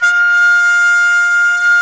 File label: WND FLT MT, Flute & Mute